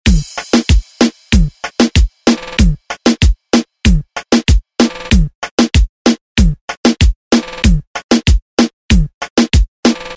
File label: ReDrum